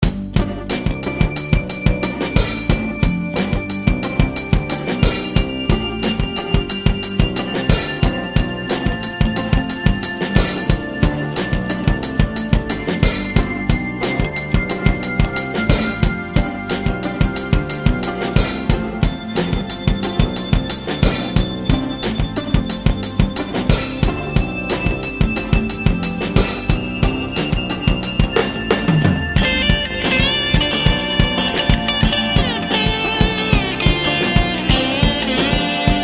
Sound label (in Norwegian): Matematisk riff